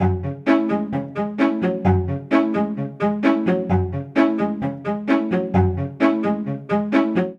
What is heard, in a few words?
Phrase Adventure F# Minor 130 bpm, String Quartet; MIDI Clips; Ableton; Spitfire Audio